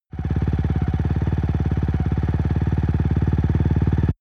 motorcycle motorcross idle

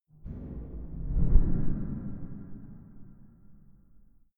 sliding impacts

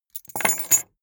key ring small place table hard

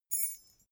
key ring small fly tinkle

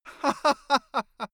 male laugh bright

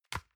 envelope drop soft dry

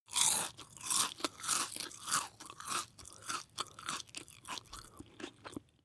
chew biscuit smack

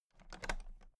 toolbox latches open very soft

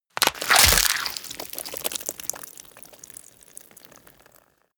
gore rip body apart

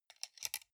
sunglasses plastic side piece fold soft

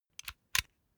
puncher operate empty

